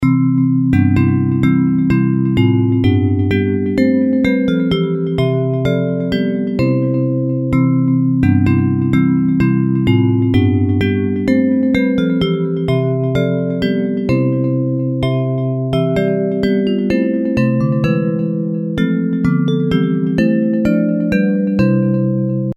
Bells; Hymns; Praise; See the Morning Sun Ascending

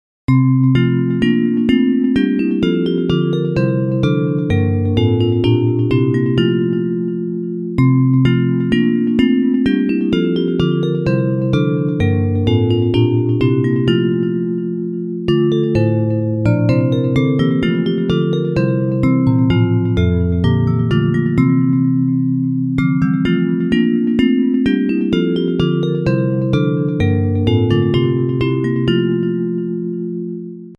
I Sing the Almighty Power of God Bells; Hymns; Praise

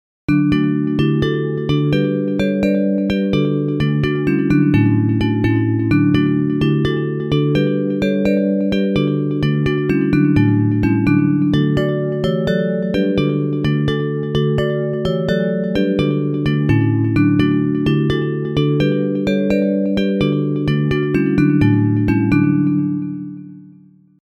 Bells, Hymns; Sweet Hour of Prayer

